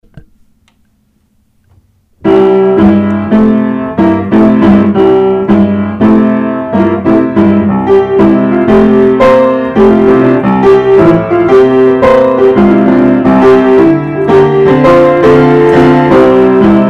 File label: piano